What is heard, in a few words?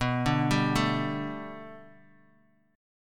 B Minor 7th Sharp 5th B Bass Standard, chord; Bm7#5 arpeggiated; JGuitar